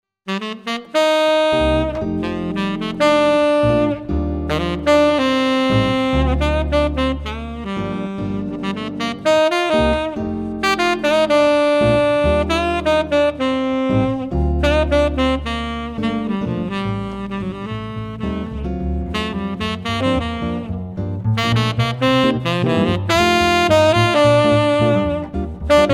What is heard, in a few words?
ensemble, jazz ensembles